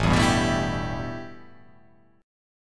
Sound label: A 7th Suspended 2nd